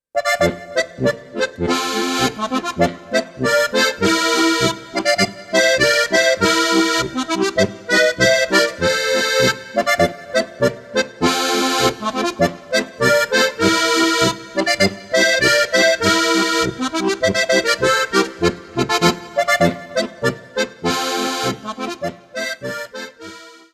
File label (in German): boarischer